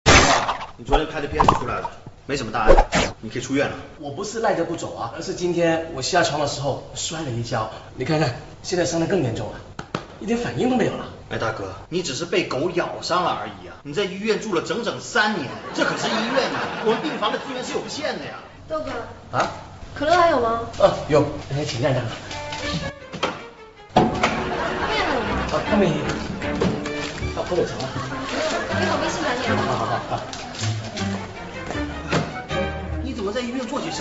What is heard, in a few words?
laugh; breadradio